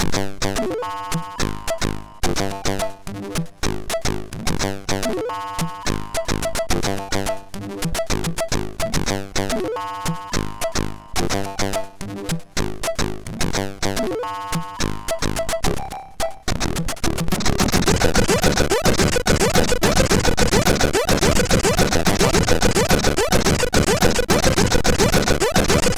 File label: sid MOS6581R2; Buttache